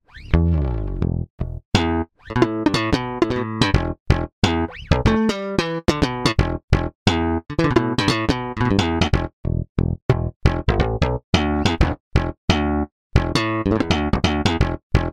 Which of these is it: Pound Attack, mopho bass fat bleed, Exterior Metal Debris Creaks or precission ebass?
precission ebass